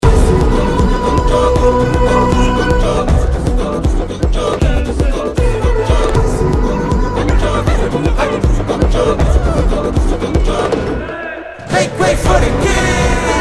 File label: BGM Extended Mix; Allu Arjun's Raaka